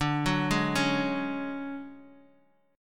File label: D Major 7th Flat 5th D down 4G 5C 5E 5A